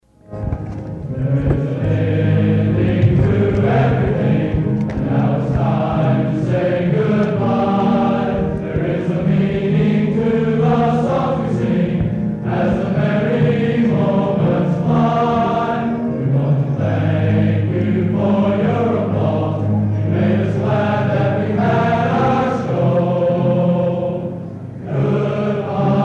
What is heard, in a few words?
Closing Chorus